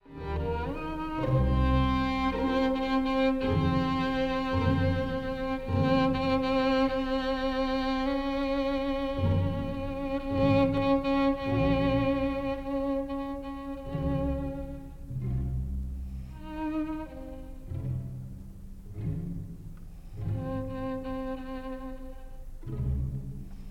Violin Concerto No. 1 in A Minor Passacaglia repeated note excerpt; Op. 77